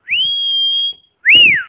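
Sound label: wolf whistle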